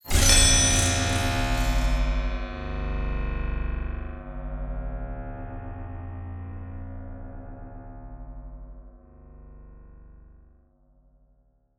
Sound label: Impact